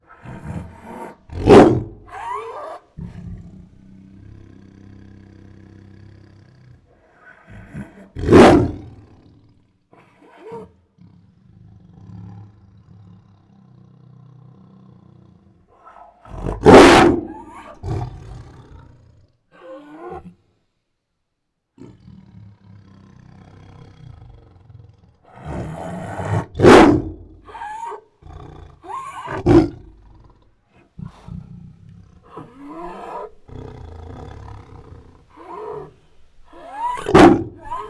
Aggressive and Protective Roars, Tigers; Sound Ideas; Tigers Sound Effects

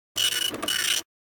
computer printer; NCH Library

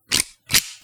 gun cock; blindside

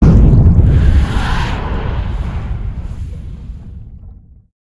hit sea torpedo; Blind Drive

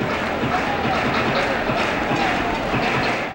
Baseball Fanfare; Crowds

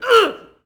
Boxing Female Punch Grunt

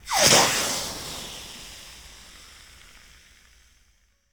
Steam Sizzle Hit

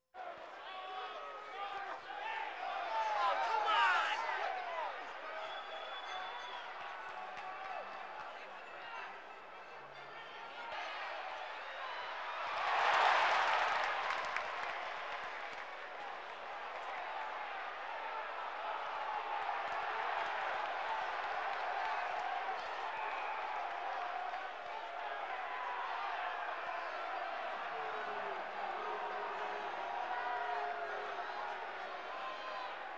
Sports crowds; Valentino Production Sound Effects Library CD50

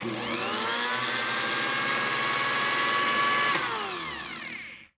Blender on Whip; Appliances; 1001 Sound Effects

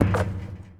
Street Ball Metal Basket; SFX Kit; Street Basketball

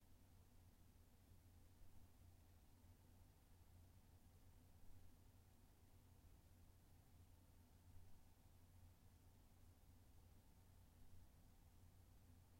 Bedroom Roomtone; blindside

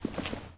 footsteps squeaky; Apotheorasis

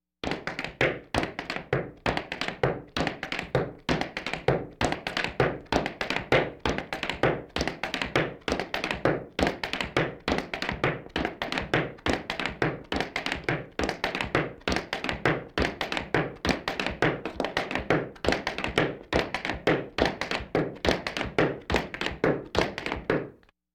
Laugh tracks; Valentino Production Sound Effects Library CD50

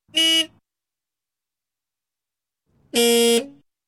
Car Horn x 2; Sound Ideas; Series 2000

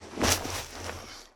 Bodyfall Snow; SFX Kit

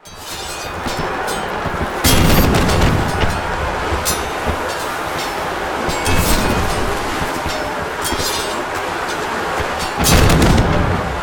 Battle, Pirate Ship Battle; gun and weapon sounds; captain audio; fully loaded

